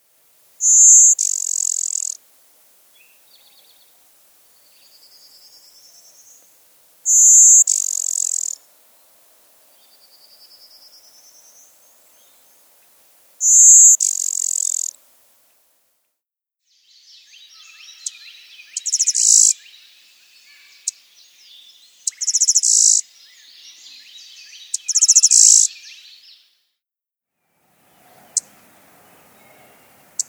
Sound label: Blue-winged Warbler